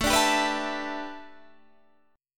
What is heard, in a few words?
A 7th Suspended 2nd Suspended 4th Guitar Open D